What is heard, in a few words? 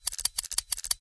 shuffle; jetpackLearningPlatform; coyote-bridge-crossing